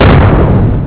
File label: explode; Coaster